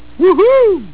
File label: woo